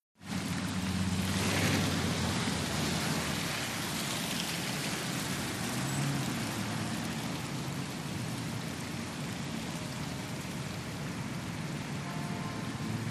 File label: Wet Traffic Ext BG